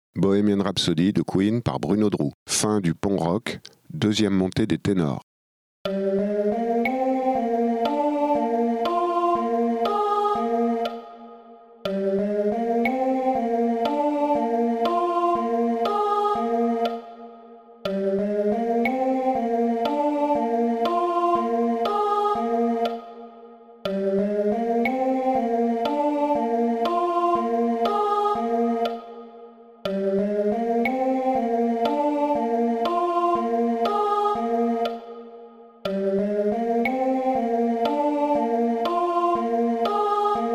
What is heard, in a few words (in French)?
Tenor Pont rock coda mesure en boucle